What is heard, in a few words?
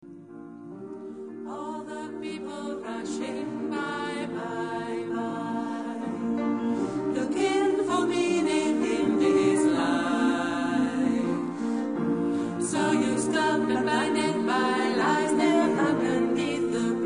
ssaatb